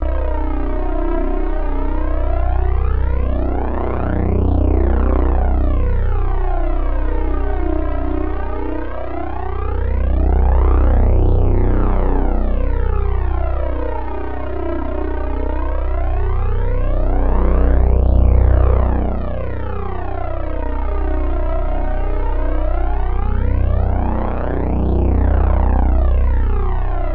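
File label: Synth Ambient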